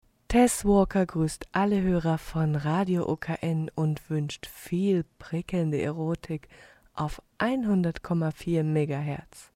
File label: radio jingle; Radio OKN